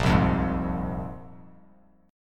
A sharp 7th Suspended 2nd, Bass Standard; strummed; JGuitar

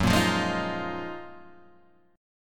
F sharp Major 13th Guitar Half Step Down

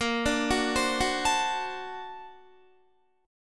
Bb Augmented Major 9th Bb, Guitar; Bb+M9 arpeggiated; JGuitar

